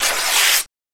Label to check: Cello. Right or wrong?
Wrong